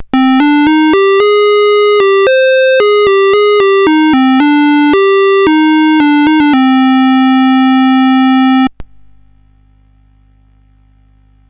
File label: Dragonworld sid CSG8580R5